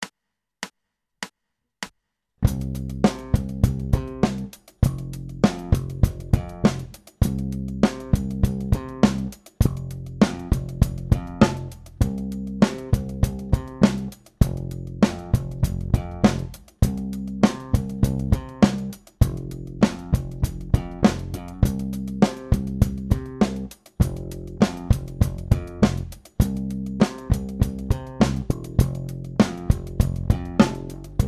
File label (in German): Slap Grooves, four strings